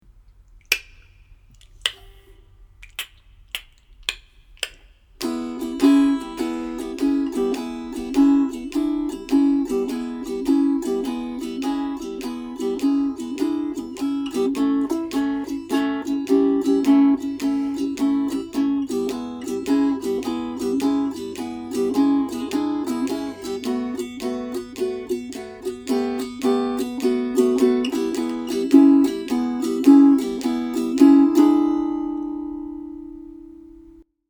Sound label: E Blues swing